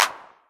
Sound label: 909 Clap, Novation Drumstation; Novation DrumstationV1